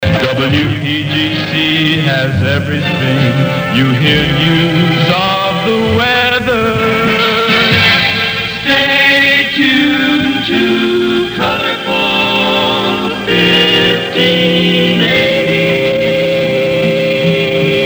jingles; futursonic; has everything stay tuned to

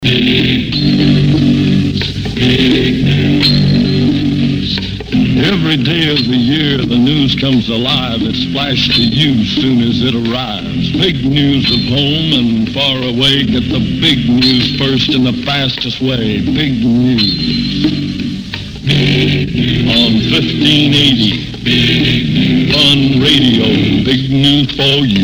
jingles; 1961; crc tune toppers

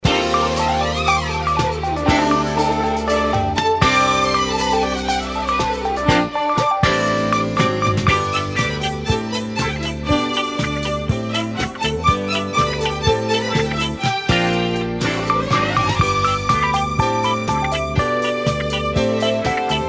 christmas kit instrumental beds

